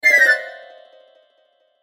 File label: Flute Glock Harp, Flute Glockenspiel Harp Chromatic Descends Falling; Cartoon; Musical; Cartoon Sound Design; Blastwave